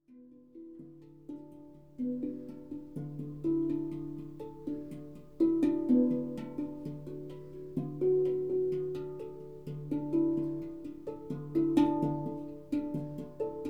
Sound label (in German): glocken; salzgrotte; caisa